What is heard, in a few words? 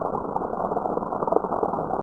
Noise; Revista CD Expert 50